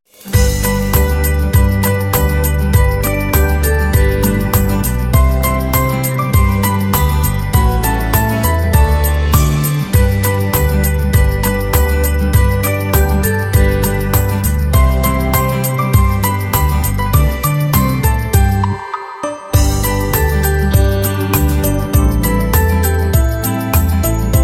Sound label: JINGLE BELLS BALLAD